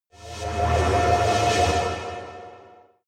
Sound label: space machine alien